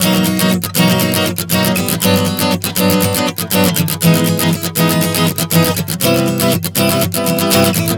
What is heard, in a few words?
B-G-E-C 120 bpm, acoustic guitar chord progressions